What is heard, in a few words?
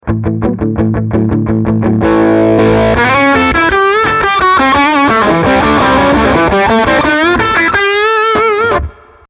rock boost